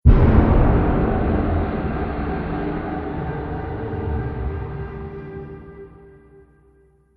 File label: Drum Accent For Trailer Atmospheric